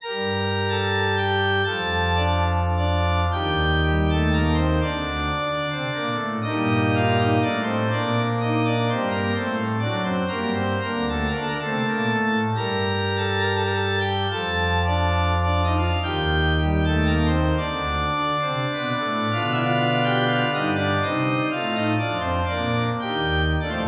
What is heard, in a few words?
Church Organ D, Minuetto in g minor, reverberated; Andreas Instrument Sounds AB